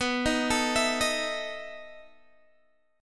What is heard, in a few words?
B 11th B Guitar DADGAD, chord